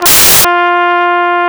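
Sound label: flugelhorn F; BRASS; Best Service; Alpine Volksmusik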